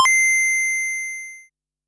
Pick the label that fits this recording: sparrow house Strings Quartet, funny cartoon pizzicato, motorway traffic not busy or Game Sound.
Game Sound